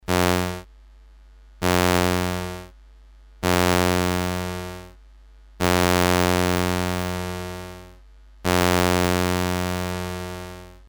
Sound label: glidophone gate